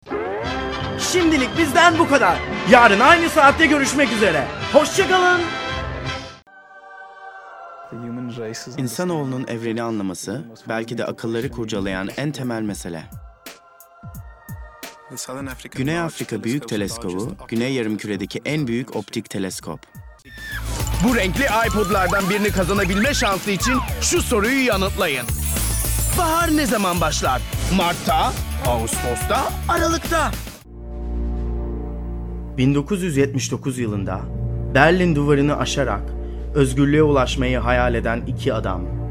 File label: turkish